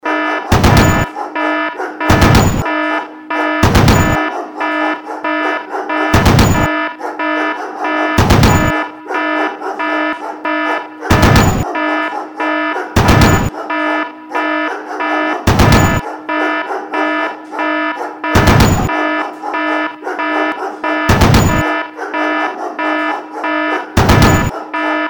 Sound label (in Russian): trevoga